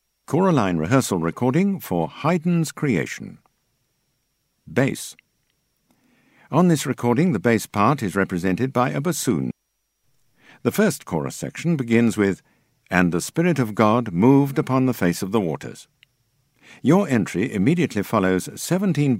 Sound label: Bass; Haydn Creation